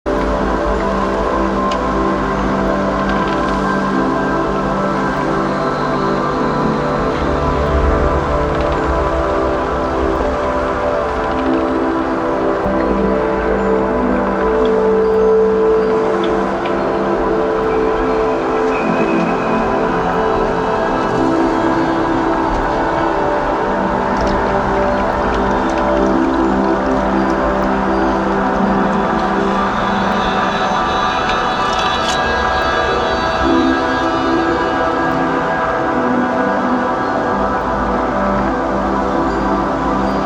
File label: pop ambient